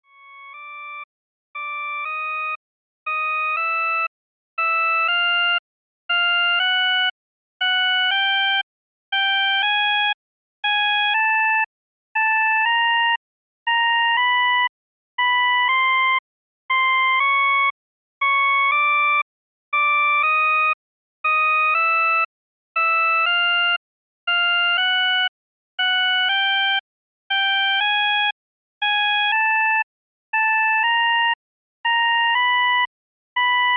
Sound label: circular scale; deutsch